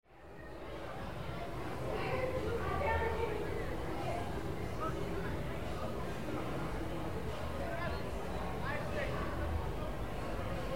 rose garden soundscape

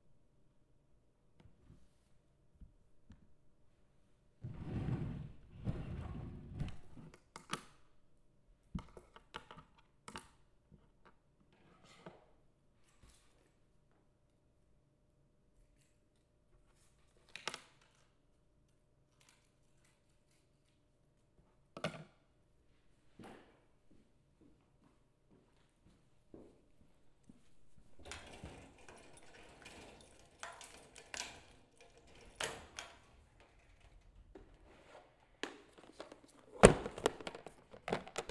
Studio Soundscape

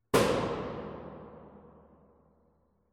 reverb stairwell; jacobs

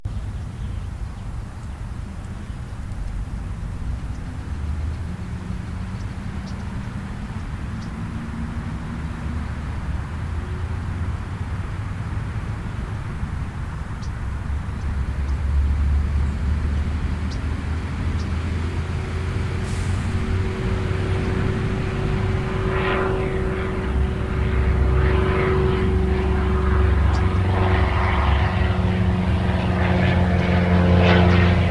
outback 1145am

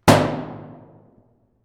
reverb Staircase, Lyon Dorm; Pomona College

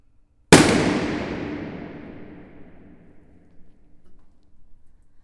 garage balloon; Scripps

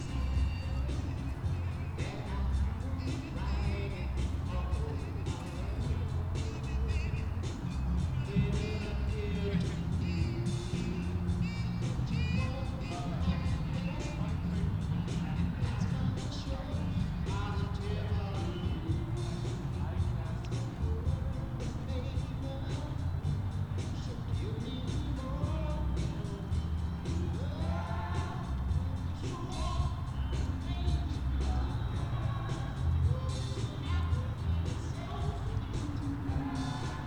soundscape quad; Mudd quad

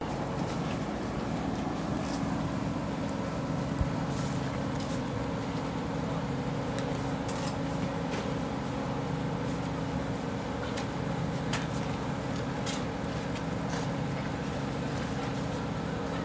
Food Trucks

